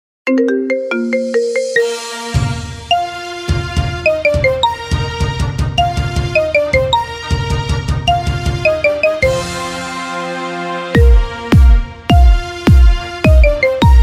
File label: Theme Marimba